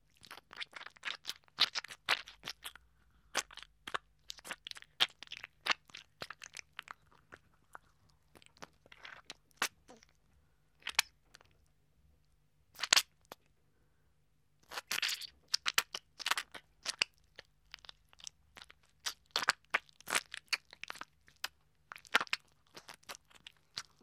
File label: Guts Being Mangled; 1001 Sound Effects; Halloween Horror Sounds Effects